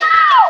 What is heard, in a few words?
ow scratch; Voice